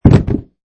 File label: Box Drop